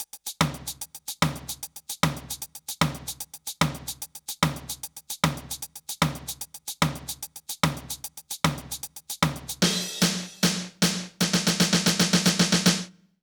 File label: British SKA Loop 147BPM (NO KICK); Kaeru Cafe; British Reggae And Ska Loop